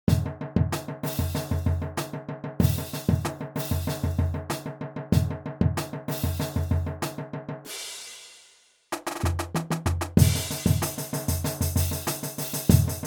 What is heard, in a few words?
stadium groove, brass